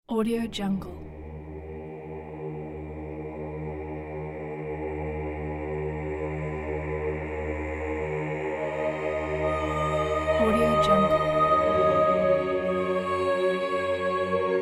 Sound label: choir